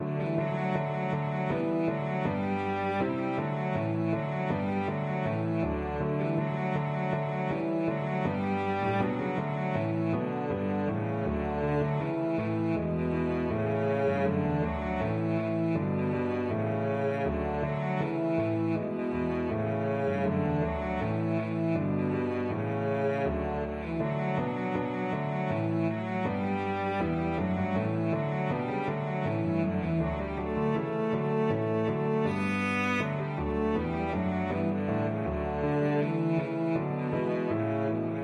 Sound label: cello